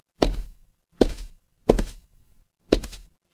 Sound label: footsteps wood